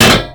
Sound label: Metal Pipe Drop; Warframe; Ambience; NineteenNinetyNine